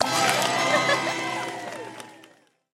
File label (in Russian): Звук покупки предмета за деньги